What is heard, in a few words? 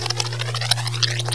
MOON